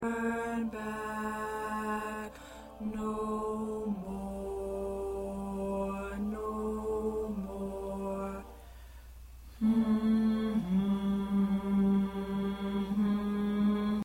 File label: 2nd Tenor